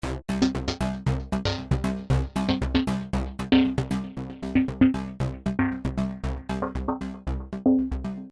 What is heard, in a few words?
Wavetable Loop